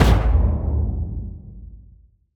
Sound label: Impact Digi